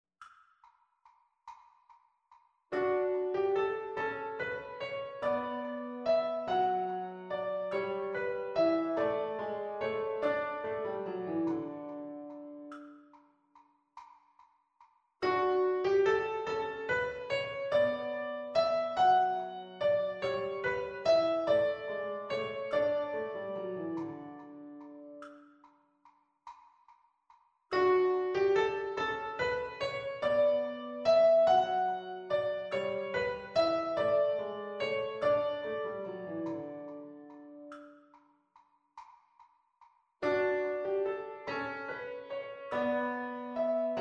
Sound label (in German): Zweistimmiges Diktat D-Dur; Unterricht